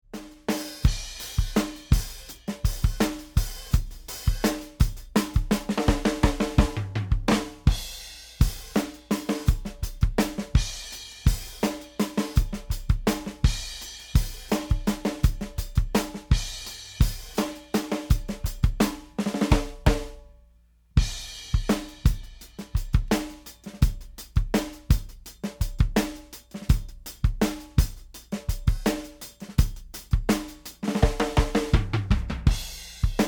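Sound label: new wave, full mix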